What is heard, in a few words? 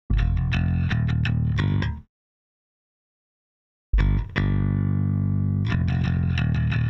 Bass